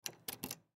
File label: Door Remove Key From Lock; Household; Miscellaneous Doors; Blastwave